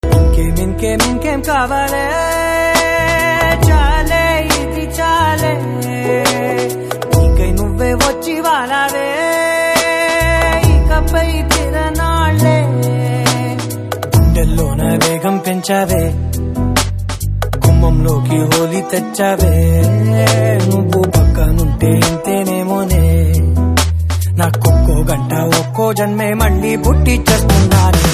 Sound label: ringtone, telugu ringtones; geetha govindam